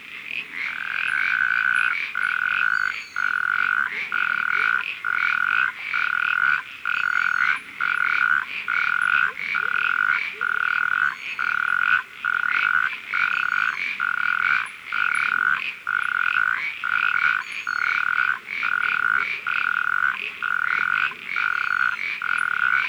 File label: natterjack toad epidalea calamita; lyagushek i zhab